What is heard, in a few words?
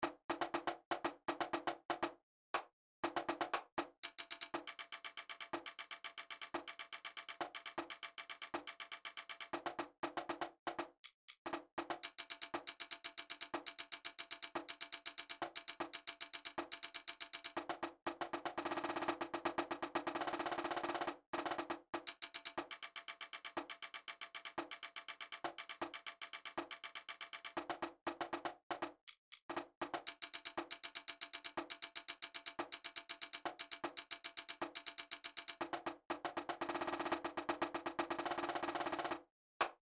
marching cadence snare drum; warrenton